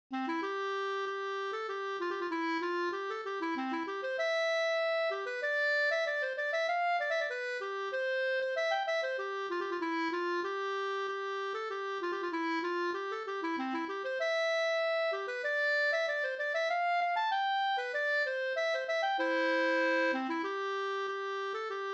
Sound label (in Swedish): brudmarsch gamla brudmarsch; Hellstedts